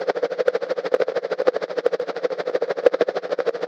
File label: helicopter; Helicopter Noise; extended noises